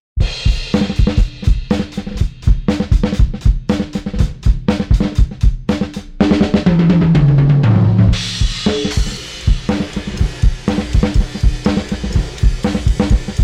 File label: EQ Low Pass; Kirchhoff